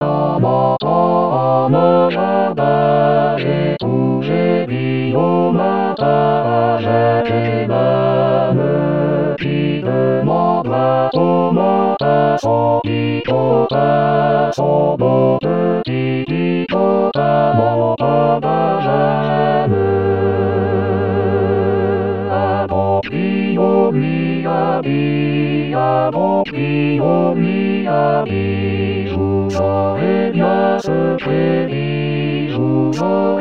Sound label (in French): basse, bass; en entrant jardin chr; 2019 rimailleurs